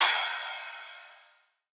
Crash, Cymbals; Cymatics; Eternity Sample Pack; Drum One Shots

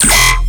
Bass Hit E, Dubstep; Gleam Bass; Ghosthack; AC2024 - Day 13 - Dubstep Sounds 2025

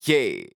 Dry Chant "Yee"; Cymatics; Euphoria Vocal Sample Pack; Chants

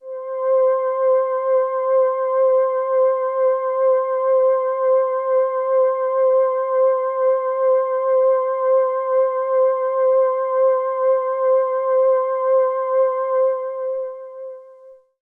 Juno 106 PAD Moon High C; Synth; One Shots; Cymatics; Fantasy Synth Sample Pack

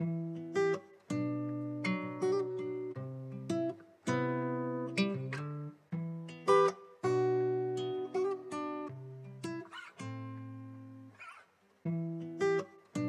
162 BPM E Min Guitar; Guitar Pack; Loop Stems; Cymatics; Miami Guitar Pack - Pt 1; 27 Club

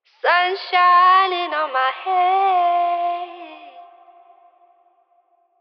Phrase Wet - Sun Shining - 70 BPM C Min, vocals; Cymatics - Eternity Sample Pack; Phrases

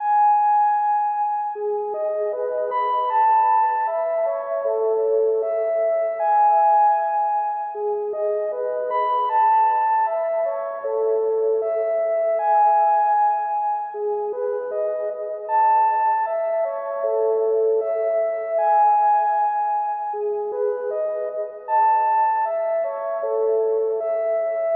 155 BPM G# Min Juno-60 Lead; Loops; Loop Stems; Cymatics; Fantasy Synth Sample Pack; Fantasy - Purple

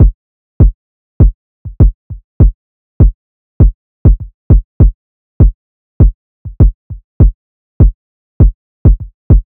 Drum Loop 100 BPM Kick, Hip Hop; Drum Loops; Loop Stems; Cymatics; No Se Nada

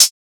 Closed Hihat, Techno; Ghosthack; Free Techno Drum Shots 2024

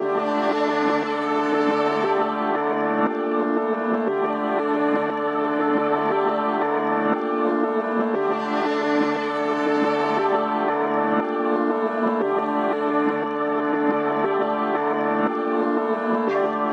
118 BPM D Min, Melodies; Cymatics; 2022 Melody Mystery Expansion

